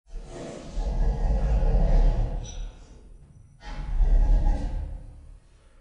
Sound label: horse neigh; ambience; dfcaverns